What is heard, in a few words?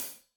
Hat Loose, Hi-Hat, Cymbals Stereo